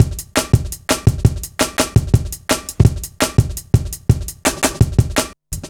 Live Wire, Drum Loops, 168.5, Jungle

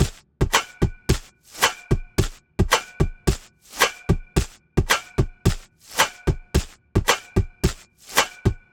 Foley Loop 110BPM, Drums; Antidote Advent

